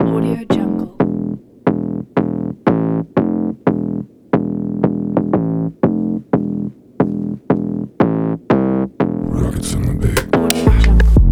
catchy stylish beat music kit

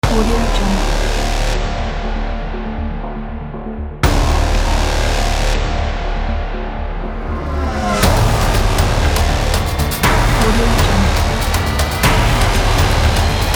cinematic disturbance ident

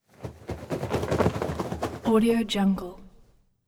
large bird wing flap

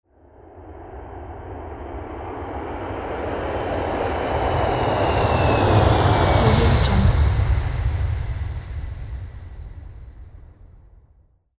airplane flying over sound

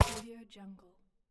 scifi cyber weapon shot

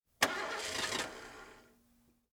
1988 lincoln town car; prosoundfx

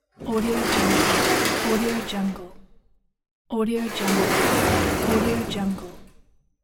sliding wooden door

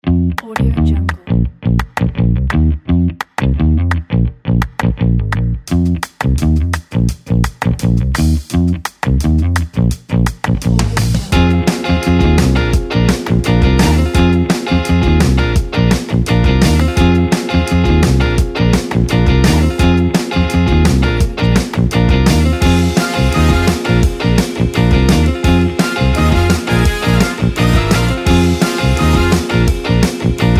upbeat quirky retro funk; delightmusicstudio

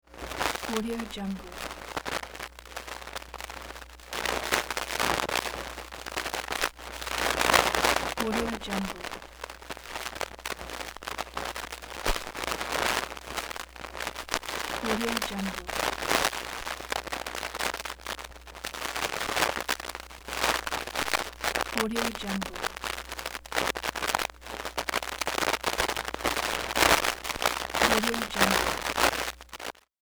static audio cable crackling

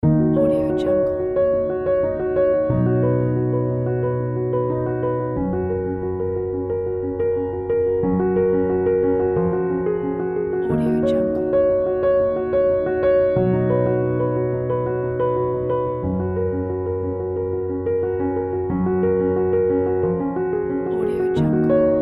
piano pack

